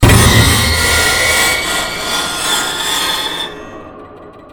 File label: Stingers; Outlast 2; PS4 Windows Xbox One